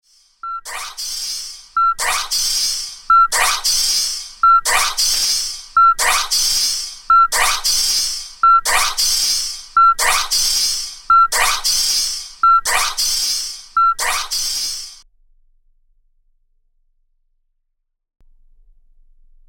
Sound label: beep